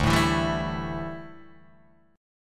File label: D Suspended 2nd Suspended 4th D down Guitar DADGAD